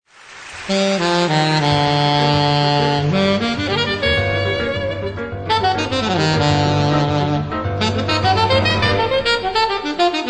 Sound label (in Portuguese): gafieira jazz saxofone